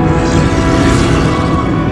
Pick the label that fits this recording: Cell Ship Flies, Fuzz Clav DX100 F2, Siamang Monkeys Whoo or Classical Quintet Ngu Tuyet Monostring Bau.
Cell Ship Flies